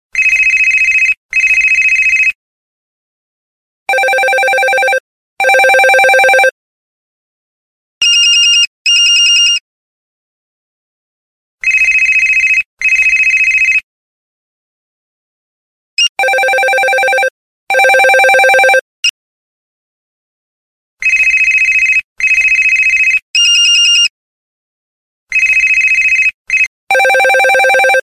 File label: Samsung Galaxy S20 Cell Sound